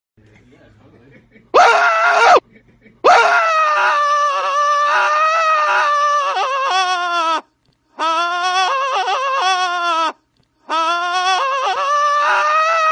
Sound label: screaming; robert downey